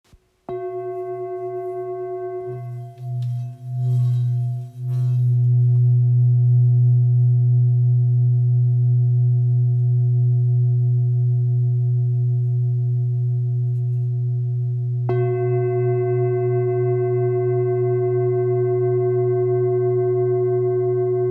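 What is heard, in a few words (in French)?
Bol full moon grave si, bol chantant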